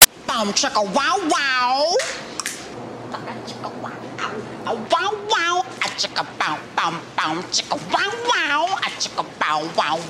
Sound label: Boom Chuka Wah Wah